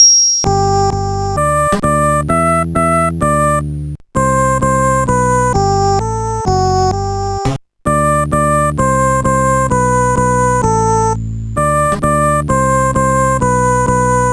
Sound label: Twinkle Box, Music Box